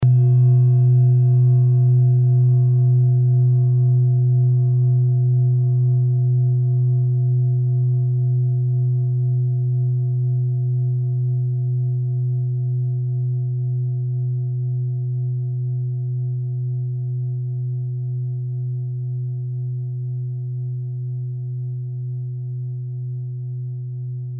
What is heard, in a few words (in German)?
klangschale tibet